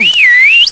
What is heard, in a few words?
whistle